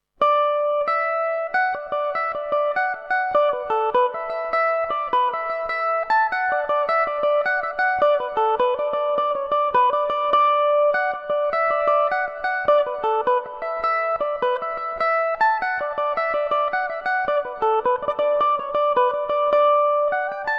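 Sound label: D major revelation, Irish mandolin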